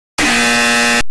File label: lie buzzer loud